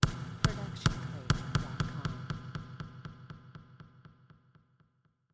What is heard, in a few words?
basketball ball bounce to a stop